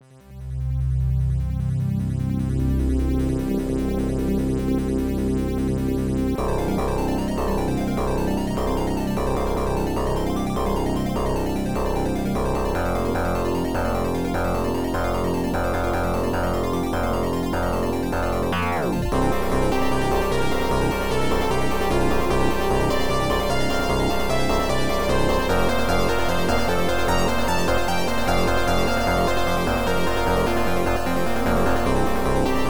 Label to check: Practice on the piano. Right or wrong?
Right